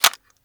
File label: mp5 mag out, weapons; garrysmod; mp5a5